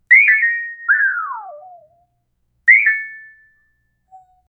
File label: a cheerful sound of a, app